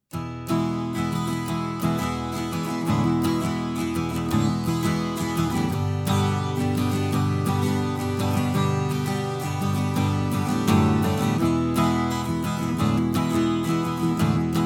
Guit, Niere, Preamp; Orpheus

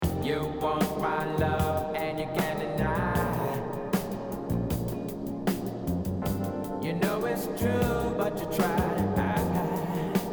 Hall

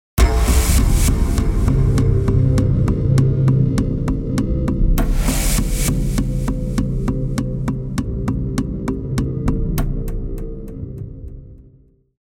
Multi aus 5 Patches

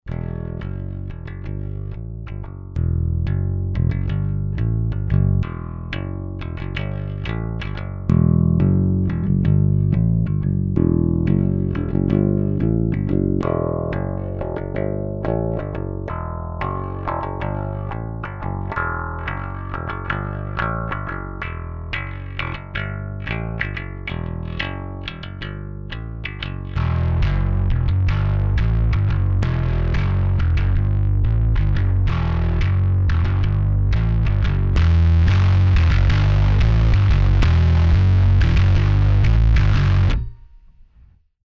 Diverse EQs Fender nur Drive Kanal; GED 2112

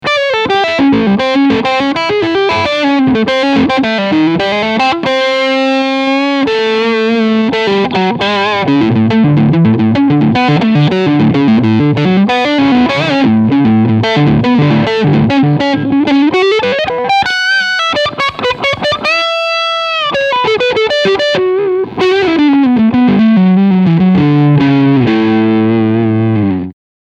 Position 8 mit Overdrive

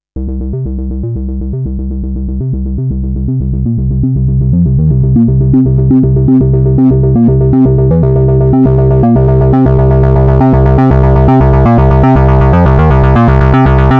Filtereingang Overdrive; MBS 100

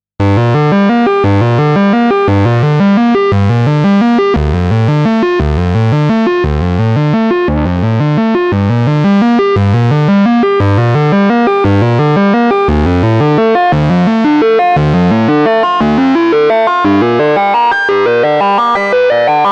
Sequenz Hard Sync

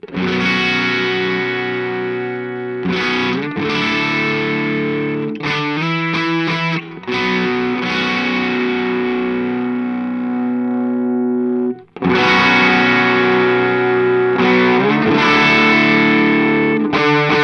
Earthquaker Devices Zoar Middle zu u aufgedreht

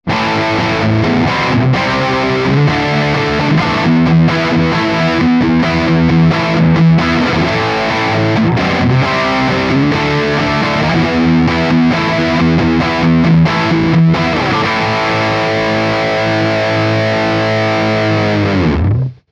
Audio Interface Riff mit PlugIn; AXE IO ONE

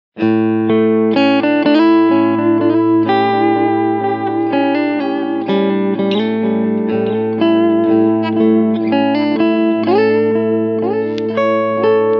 Echo mittel

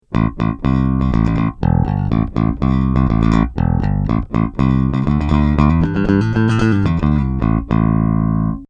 Referenz Bass clean passive

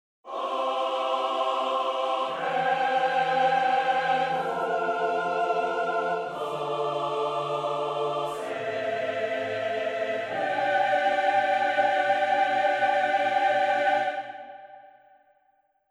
marcato gelooped

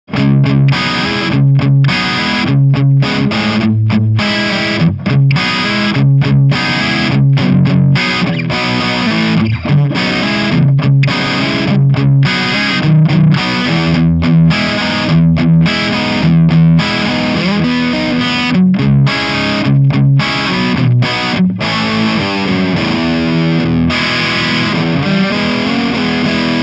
Crunch Steg

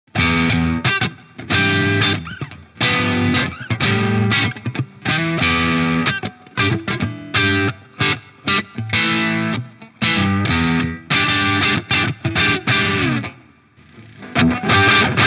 Tubecreamer mehr Verzerrung vor angezerrtem Amp

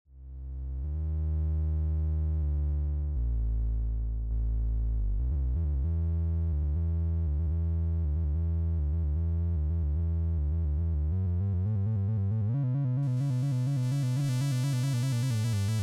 MicroFreak Basic Waves Virtuell Analog

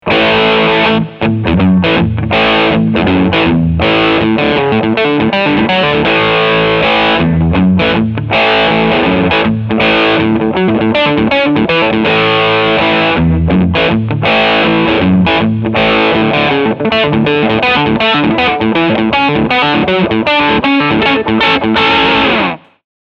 Crunchsound

